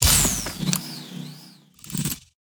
yoyo

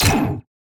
turn off TV

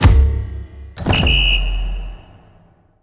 start banner

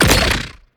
gavel

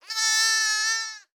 bleat one

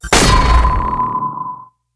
scissors hit

